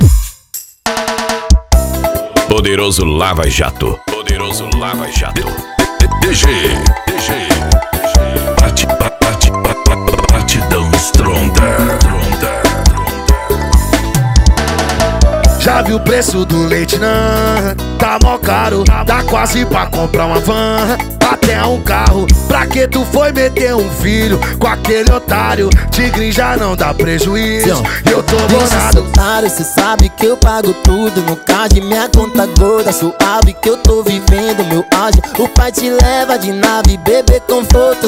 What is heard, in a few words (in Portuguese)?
funk sertanejo